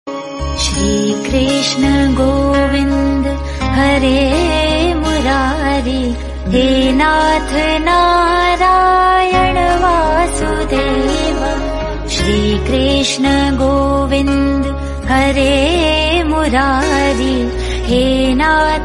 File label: Bhakti Ringtone; Shri Krishna